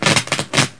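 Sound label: small spark, electricity; SiN; environment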